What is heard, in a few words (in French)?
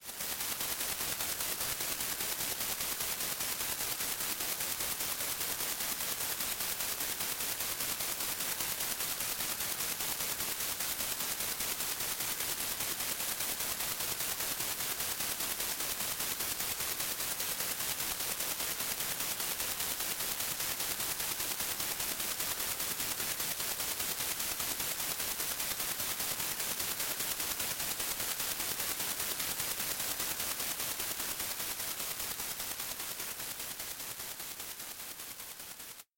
polysthyrène